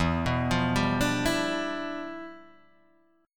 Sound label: E Minor 7th Flat 9th E